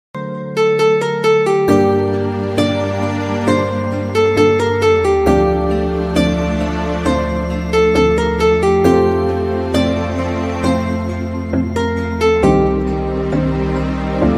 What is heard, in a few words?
Instrumental Song Ringtone; ringtones